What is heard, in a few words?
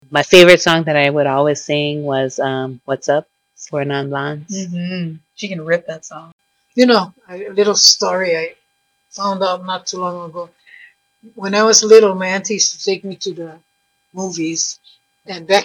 singing; CHARDONNAY